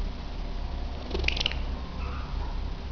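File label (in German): tonloses keckern, wellensittich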